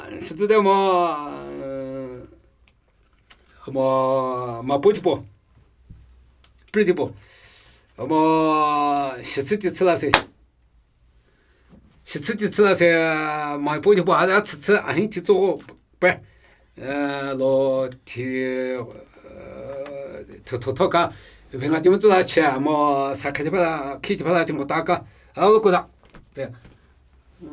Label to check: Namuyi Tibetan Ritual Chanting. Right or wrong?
Right